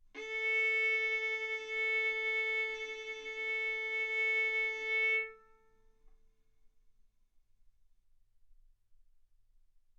Violin arco A4 B4; mf; sul A; stereo

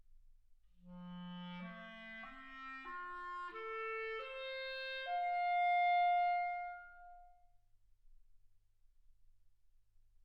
clarinet

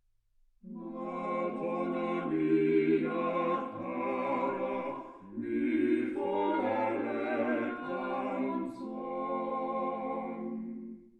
quartet

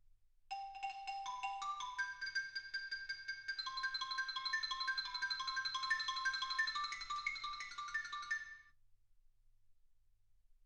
xylophone

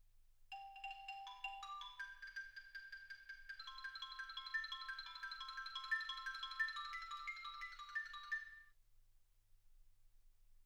xylophone

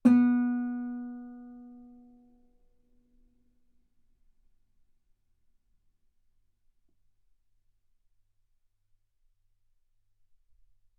Guitar B3

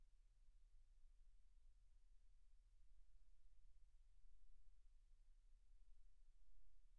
silence 48kHz

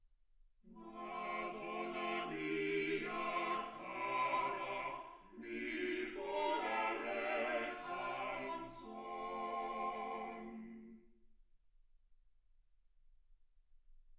quartet

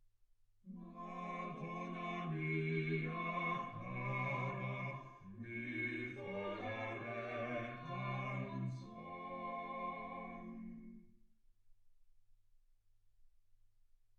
quartet

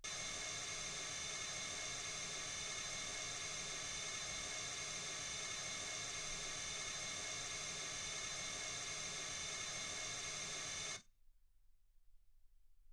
mls16 48kHz

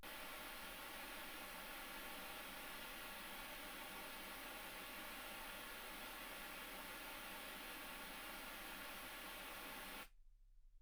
wgn

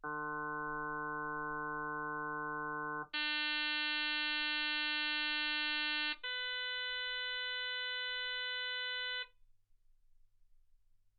harm sinus 48kHz

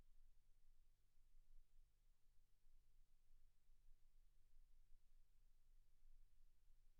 silence 48kHz

